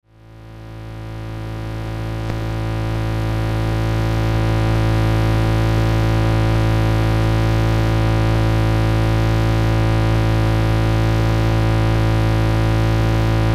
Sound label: lock bass groove tone